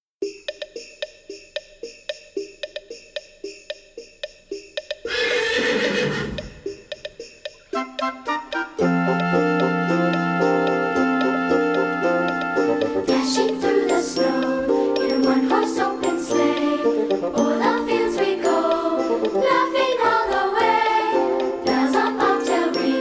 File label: Jingle Bells